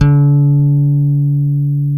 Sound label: Bass, LATIN, D#4; Spectrasonics; Bass Legends; ROUND LATIN